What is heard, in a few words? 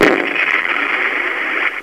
blast supershot, Lazer Tag